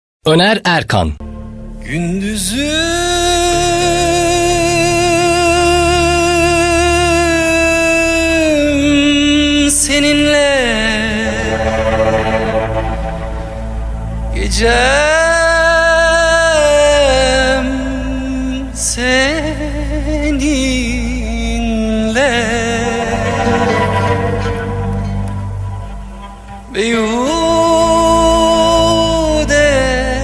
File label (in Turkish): vokal